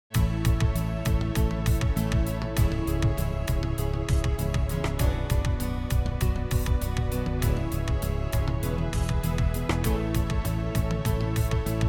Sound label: 90s POP Happy; amperAI